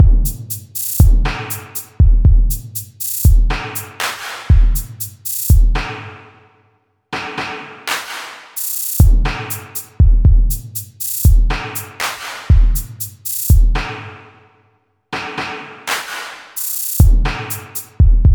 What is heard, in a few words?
Drum Pattern